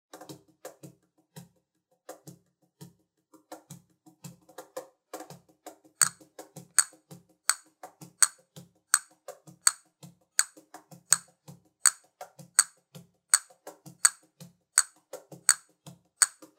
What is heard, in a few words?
castanet